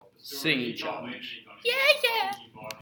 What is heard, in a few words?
singing challenge